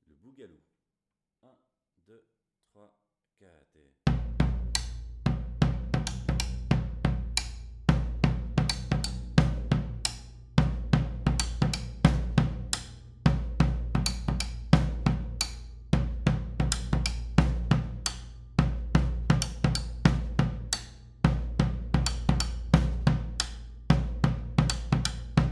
Boogaloo Bass, Batucada

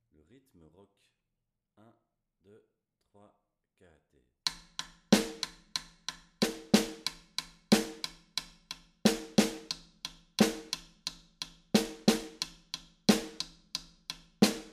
Rock Snare, Batucada

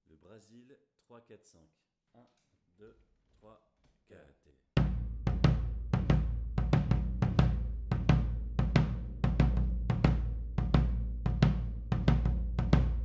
Brazil 345 Bass, Batucada

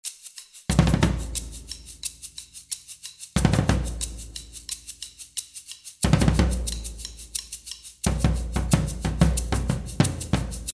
Tribal Style Bass, Batucada

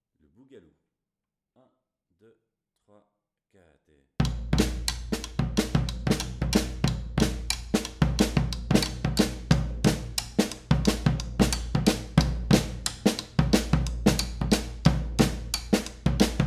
Boogaloo, batucada

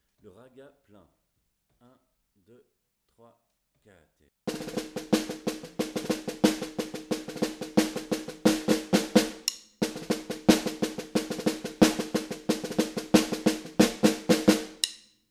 Ragga Plein Snare, batucada

